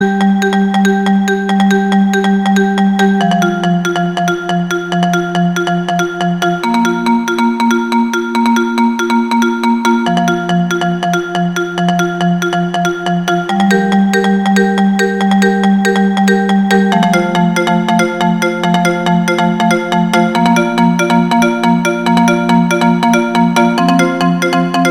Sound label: iPhone Marimba Tone; toque de celular